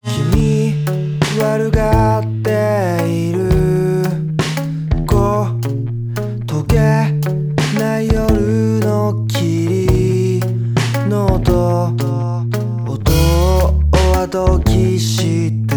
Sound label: midi